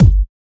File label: raw kick single hit g key